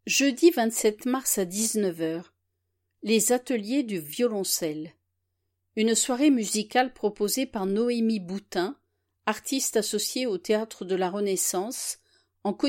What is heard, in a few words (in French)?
violoncelle